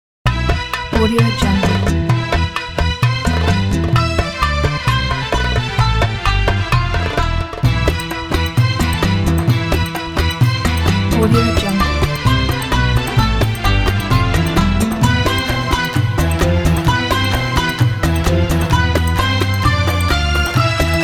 orient girl on the table ident; okanakdeniz

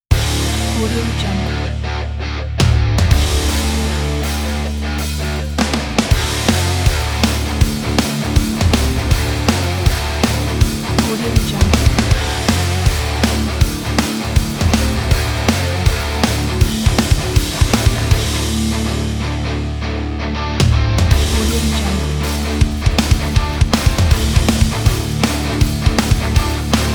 dirty heavy metal rock

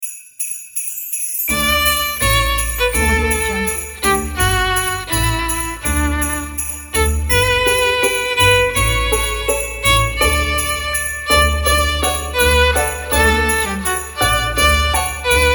joy to the world

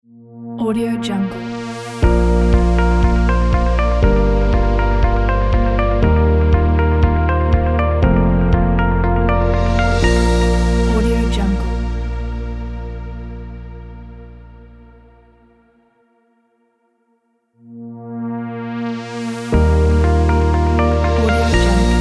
logo corporate

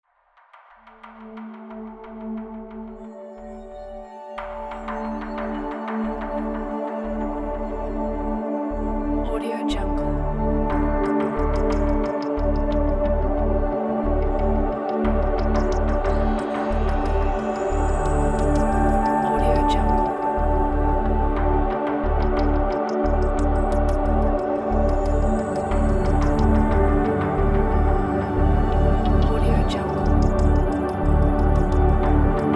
abstract soundscape background

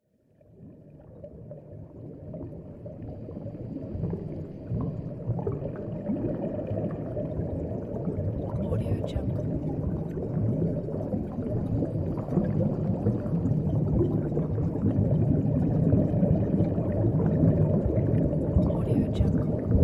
underwater deep ambience

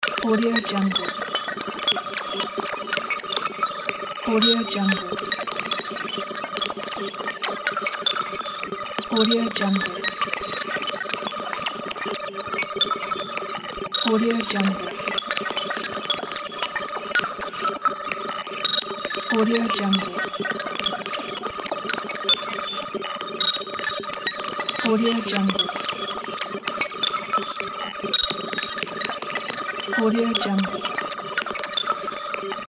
digital sound; phillipmariani

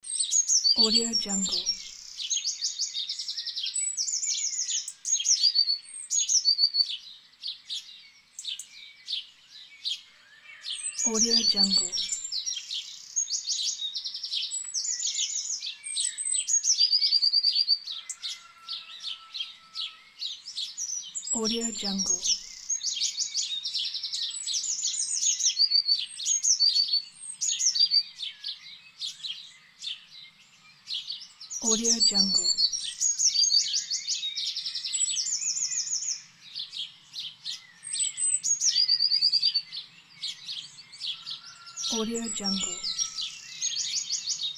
bird song

